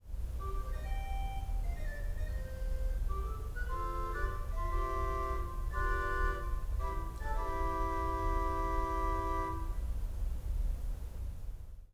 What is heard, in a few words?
quintadena